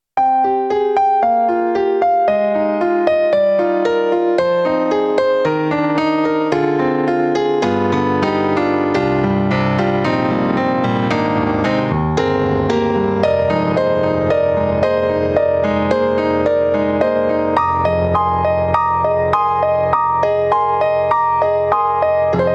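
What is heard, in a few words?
YAMAHA MOTIF-RACK ES Mono Comp, piano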